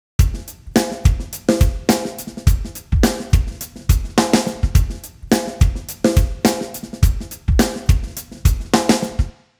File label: drums